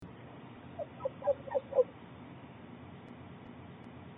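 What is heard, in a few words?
Short-eared Owl barking series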